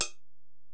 HiHat, Alesis DM-PRO

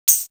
Tambourine; Coldcut's Kleptomania VOL1

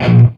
chunk A#, heavy metal; Greytsounds

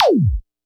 kit, Yamaha Motif-ES8

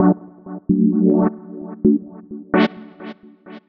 Synths, Underground UK House & Electro

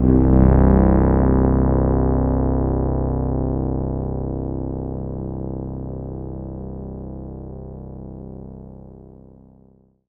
C2, Synth Line; 90 s Sample CDs; NiceBeats; SynthLine VOL-24

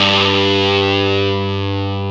GTR Fuzz, Dirty; Club-50 - Foundations Roland

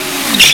SCREEECH; FAIRLIGHT CMI IIX; SFX

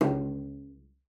C#2, CELLO FINGER; Xsample VOL-5

